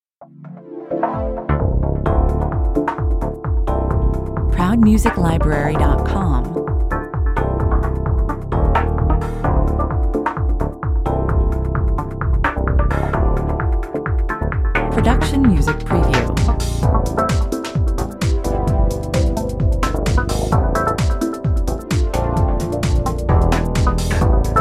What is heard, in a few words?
fast chase 130 bpm